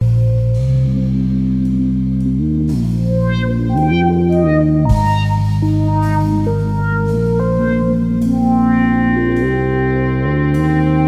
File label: Synth Improvisation